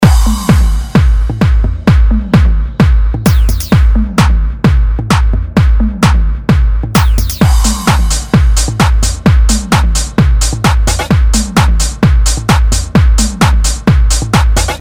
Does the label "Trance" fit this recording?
Yes